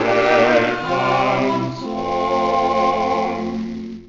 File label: quartet test